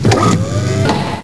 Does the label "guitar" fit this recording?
No